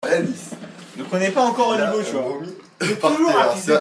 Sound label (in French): vomi